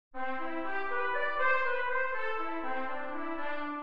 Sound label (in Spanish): Maj7 en C